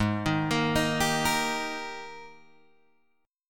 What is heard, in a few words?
G sharp Major 7th Suspended 2nd, Guitar, Drop D; 6,6,8,8,8,6